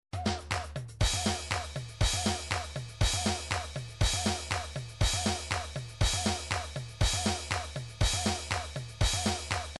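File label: drums pattern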